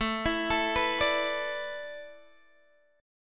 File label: A Suspended 2nd Suspended 4th, Guitar, DADGAD